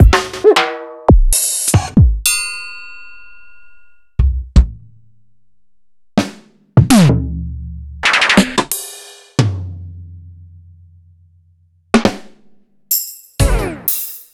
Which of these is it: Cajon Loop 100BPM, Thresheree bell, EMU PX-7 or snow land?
EMU PX-7